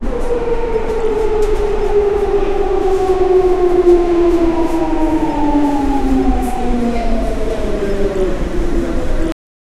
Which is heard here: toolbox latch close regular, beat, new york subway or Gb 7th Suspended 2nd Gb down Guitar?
new york subway